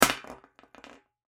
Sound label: Bullet Shell; Bullet Shell 12 Gauge Drop Metal Surface; Drops (Sound Effects:Objects); Blastwave; Warfare